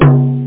bongo

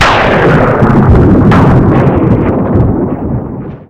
Thunder; Barrage

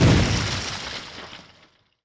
ship collide; PHARAOH

